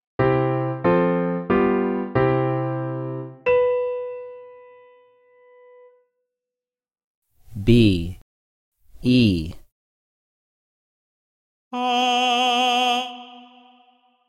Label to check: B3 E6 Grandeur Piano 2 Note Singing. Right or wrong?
Right